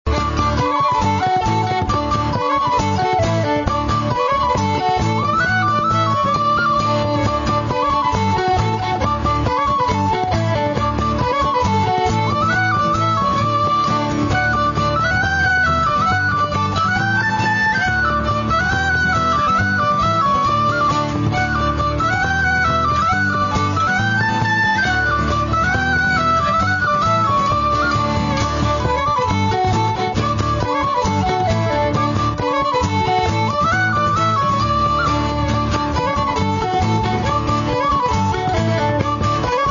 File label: polka; ryans